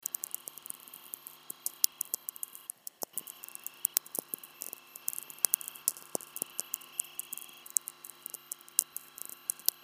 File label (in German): Feld Hinten, Natural Radio